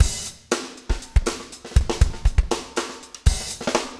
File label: breakout 120, 120bpm, loops